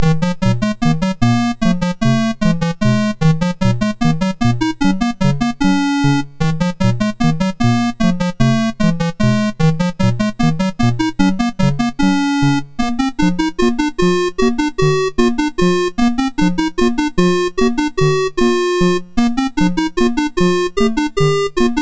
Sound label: sid MOS6581R4; Bear George